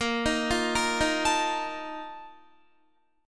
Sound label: A sharp Major 7th Suspended 4th, Guitar; arpeggiated